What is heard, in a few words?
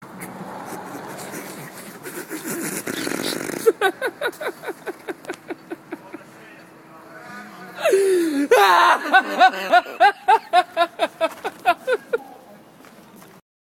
Funny Laugh